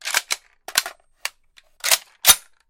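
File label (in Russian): продолжительный перезаряд оружия перед боем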